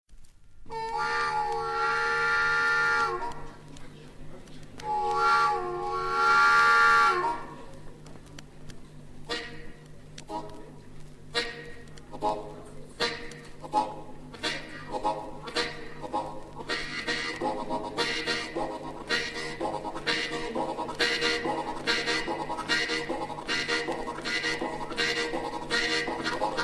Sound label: train blues